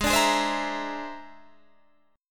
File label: Bb 9th Flat 5th Ab, chord